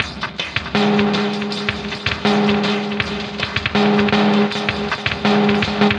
Loop; Sonic Foundry (Sony Creative Software); Esoterik Beatz; Morph

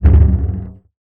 Percussive Hit, contact mic

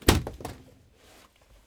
SUITCASE, Tools; AKAI S6000 CD-ROM - Volume 6

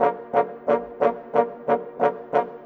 French Horns, Orchestral; Sonic Foundry (Sony Creative Software); Orchestral VOL-2; Modern 07

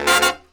D BRASS; Hip Hop; E-MU Formula 4000 Series Vol. 1; Hip Hop Nation; Booty Brass

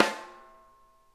SNARE RIM; Sonic Reality; Interactive Drum Kits

